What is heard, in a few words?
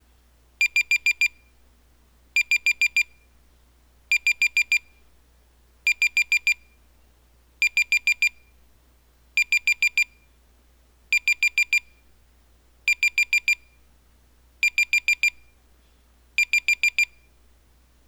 DI DI DI, Piezo Siren